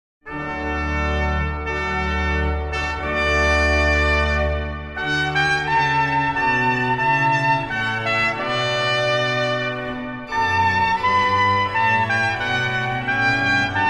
Marsch, D-Dur; Suite D-Dur

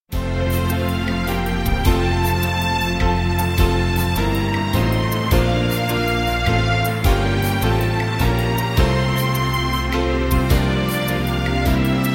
Chor

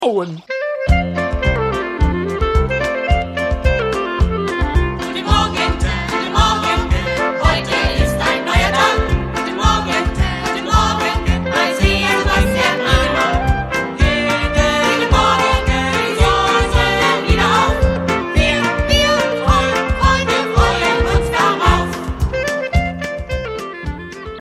Lied Country Song

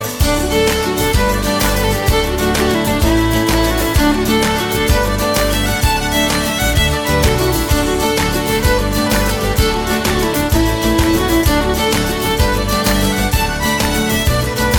Instrumental

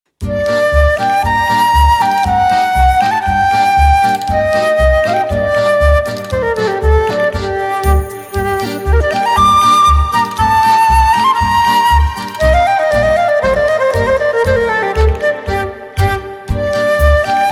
Ungarischer Tanz

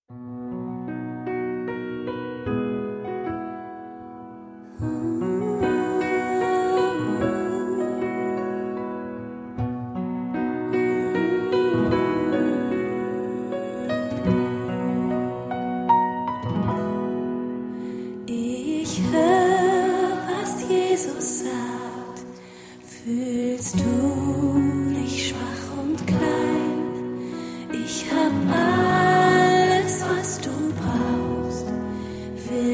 Chor, Filmorchester

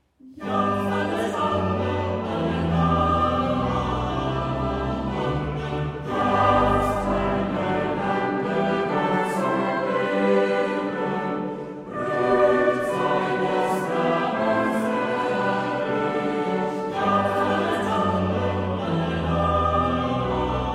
Chor

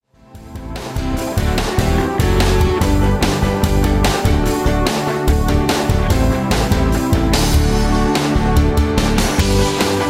Freudenschrei Playback

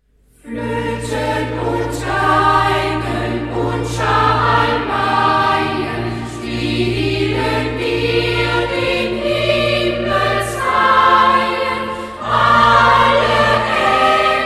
Floeten und Geigen, Kinderchor

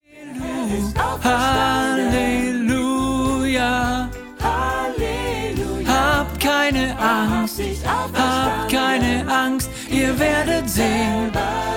Lern Tenor

